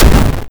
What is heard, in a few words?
Explode, space shooter; 1bit godot course